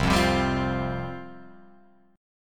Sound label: C Suspended 4th C, Guitar Open F; Csus4 strummed; JGuitar